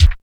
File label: CYBER KIT, DRUMS